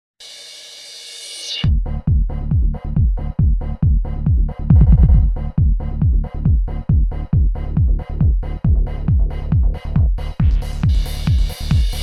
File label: techno